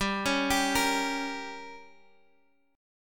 G Diminished, Guitar, Drop D; down

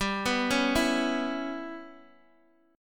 G 6th Flat 5th, Guitar, Open D

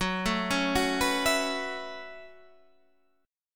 Gb Minor 11th Gb down Guitar Standard; Gbm11; arpeggiated; JGuitar

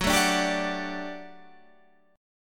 G Major 7th Suspended 2nd F sharp

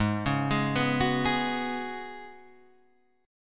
Db 7th Suspended 4th Ab, guitar

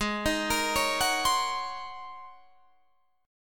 Ab 11th Ab Guitar Drop D; 18,18,20,18,19,20; Ab11 arpeggiated

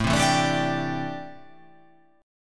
A Minor 11th A, Chord

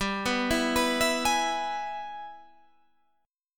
G sharp Minor Major 7th Sharp 5th

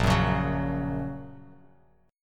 Bb Minor Sharp 5th, Bass Standard, Bbm#5 chord; strummed; JGuitar

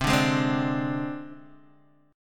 C Suspended 2nd Sharp 5th, down, Guitar, Open D

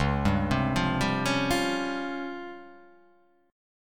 C sharp Major 7th Flat 5th, chord

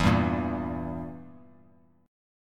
E Diminished, Bass Standard; Edim strummed; x,7,5,3; JGuitar

